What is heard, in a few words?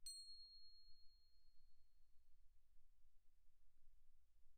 crotale C8 pp; Percussion; crotales